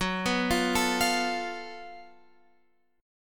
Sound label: Gb Minor Major 7th Double Flat 5th, Guitar Open F; arpeggiated; x,13,11,12,12,12